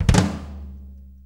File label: 6-8 Kit Flam; Drum Tools; Sonic Foundry (Sony Creative Software); Loops & Samples Drum Tools; Flams